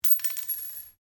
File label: Cartridge 22 250 Rem impact ceramic tiles, shell casings; shell casings of different calibers impacting on different surfaces